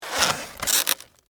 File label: Styrofoam Close, Foley, Styrofoam, Container, Close; Squeaks